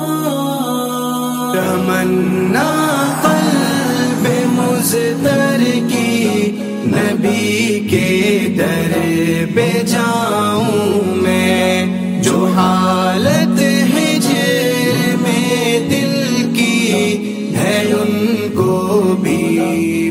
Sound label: Islamic Ringtone